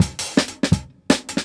Jungle Breaks; 90s Sample CDs; Original Jungle Breaks

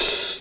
Drumulator Hat O; Drums

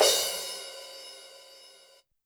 Crash Cymbal; YAMAHA RM1X

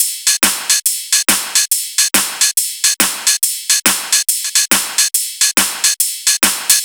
drum Loop 140BPM Full Full; Vengeance - Essential Clubsounds Vol.5; VEC5 Drumloops; VEC5 Multi Loops 140BPM

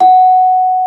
GENDER PAN, Bronze Gamelan

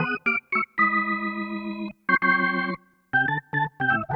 Cruisin' Disco Organ, 70s Analog Funk; Sonic Foundry (Sony Creative Software); What it Is!

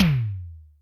Electric F, Yamaha DTXpress Electric Tom; 300 Drum Machines

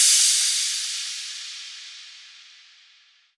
Crash, Cymbals

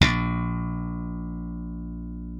A#1, slap thumb; Xsample VOL-1

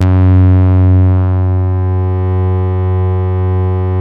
PHAT BASE, BASSES; Masterbits; Vintage Voltage

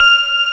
BELL; WORS STATION; BODY BEAT

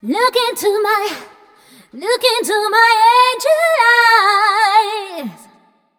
f mel f, fem Vocals

